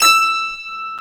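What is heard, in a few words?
Harpsichord; CMI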